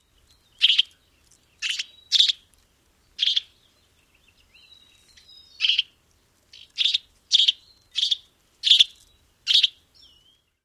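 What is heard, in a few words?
Bewick's wren calls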